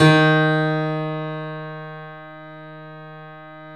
3D Pianos Yamaha C7; E-MU Producer Series Vol. 5

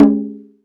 bata; AKAI S-Series CD-ROM Sound Library VOL-5

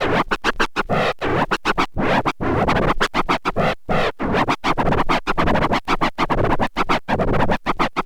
scratch med, Vinyl Attack scratches mix; Masterbits

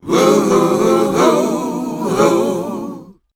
Voice Spectral, H PHRS

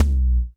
BD, Bassdrums, Roland TD-20

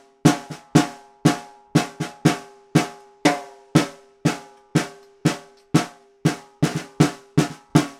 Vintage Ludwig Snare, Percussion; Appalachian; Continental Drift Aftershocks

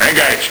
LSDj, Nintendo Gameboy; 300 Drum Machines

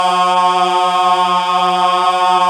GOSP. AAAHH; Sound & Vision - Gigapack II CD 1

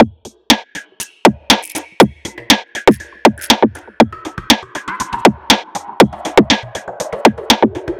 Creeper Beat, Drum Loop; Sonic Foundry; The Electronic Music Manuscript Collection; Drum Loop Structures

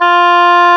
OBOE F4; Roland - LA Composer vol. 1; WOODWINDS

